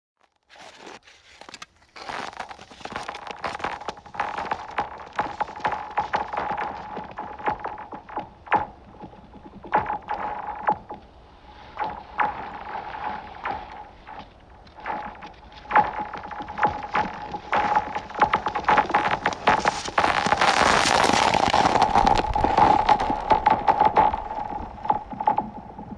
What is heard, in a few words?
Ice cracking under skates